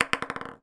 bauble small decoration christmas down table bounce fast; foley; zapsplat